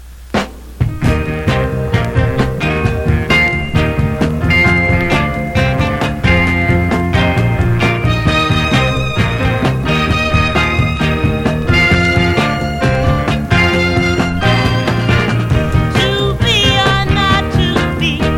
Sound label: adlibs